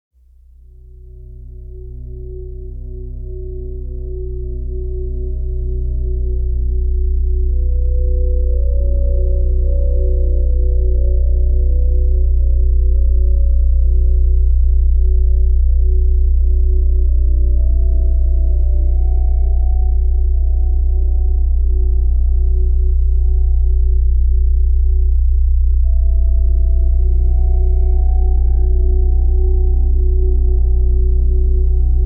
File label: Atmosphere in B Minor; Succession Season 2; HBO